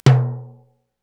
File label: TIMB LO, Korg EMX-1, Drum Machines